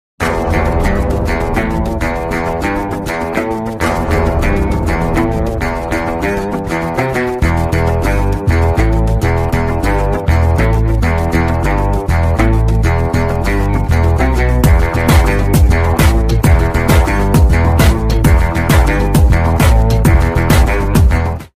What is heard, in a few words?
bgm ringtone, guitar instrumental; billa; prabhas